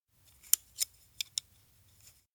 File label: hand stop chopsticks; anemoi